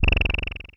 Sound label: Pulsar, Effects; Ableton; Creative Extensions; Poli